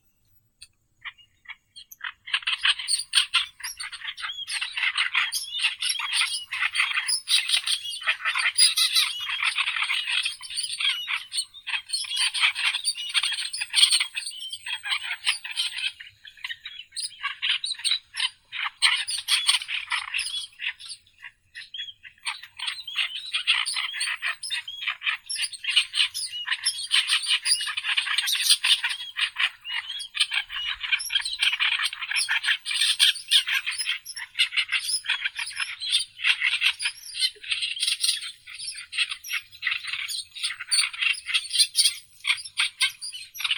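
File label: oriental reed warbler, acrocephalus orientalis; kamishovka drozdovidnaya vostochnaya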